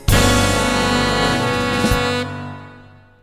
Puzzle Classique

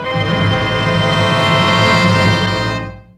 Puzzle Dramatique

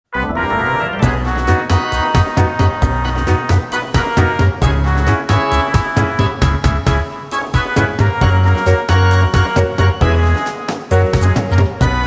(karaoke); Tokyo Godfathers

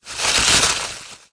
secoue arbre

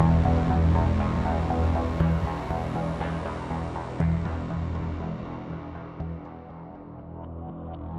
musique tutorial jingle defeat; gladiabots

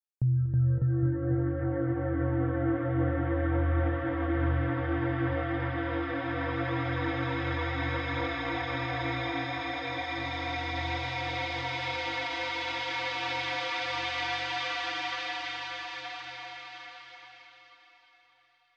Amb Drone Resonne

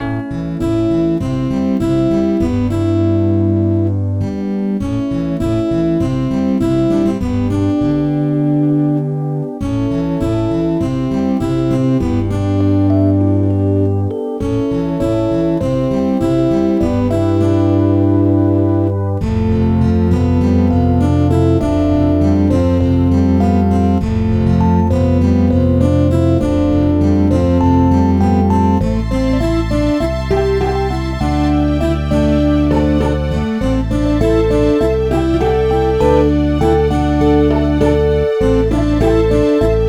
Music Douce; Emma at the Farm; nds

